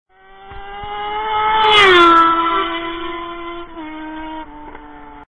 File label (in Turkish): motor sesi